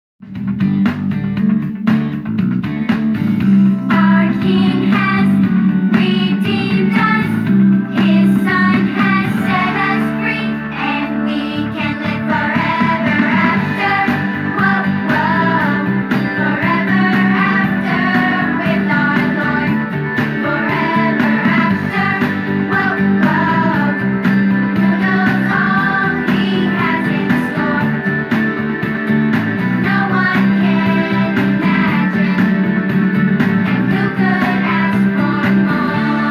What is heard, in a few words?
audition song